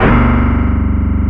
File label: HARD BASS